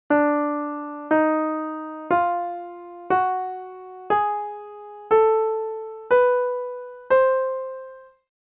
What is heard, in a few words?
octatonic t2; scale mode